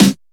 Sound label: Snare; Igual O Peor